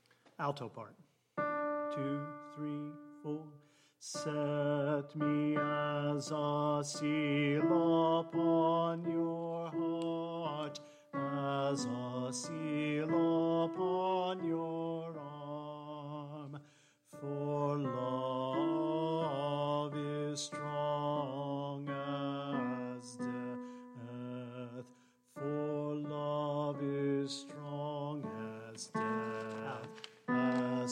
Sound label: Alto